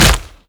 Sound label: step close, zombie blind; Half Life Alyx; creatures